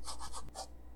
write; cartographer

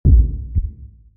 slow heartbeat; dfcaverns; mapitems

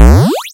health; BobBlocks

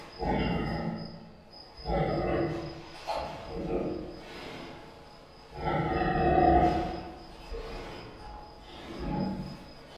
pig grunting grumbling; dfcaverns; df ambience

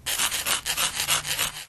fire sticks; lib materials

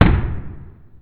firework boom

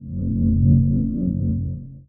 portal ambient; nether

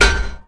heavy helmet

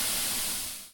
dripping water lava drip; Ambience

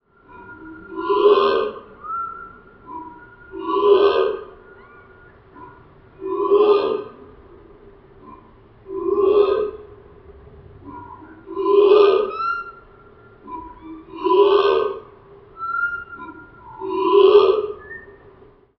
long bird song slow; dfcaverns; ambience

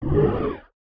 hit hunger; server-nalc

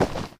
dirt footstep; nalc game

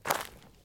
gravel footstep; minetestforfun game; nalc server

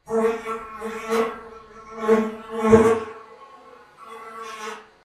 flies; dfcaverns; df ambience

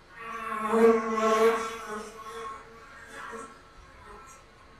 flies; dfcaverns; df ambience

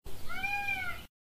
peacock; ambience

